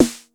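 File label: 909 snare drum, TR-909; Crypton - DrumBase5000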